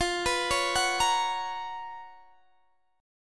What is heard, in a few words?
Db 13th F, guitar